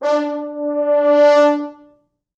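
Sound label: French horns, four, d4; East West; Quantum Leap Horns Sax; 4 FrHorns fts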